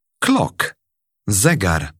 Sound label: clock